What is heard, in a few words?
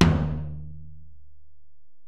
Tom, Alesis DM-PRO, Drum Machines, Toms